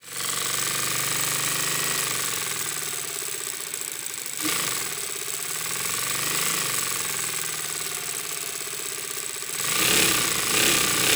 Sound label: Sewing Machine; SoundBits; Electro-Mechanics ToolKit